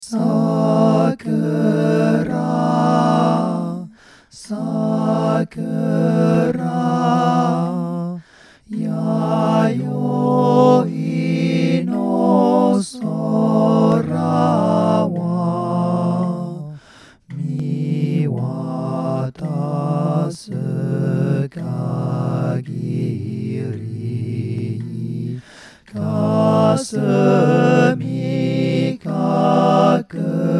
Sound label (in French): tutti voix